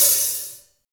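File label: hi-hat; Best Service Gigapack 2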